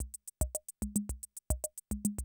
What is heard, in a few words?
CR78, DrumLoops